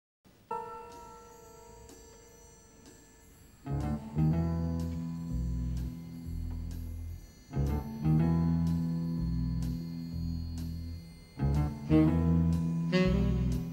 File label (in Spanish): Sonido de saxofon tenor; Instrumentos de viento; Viento-madera; saxofones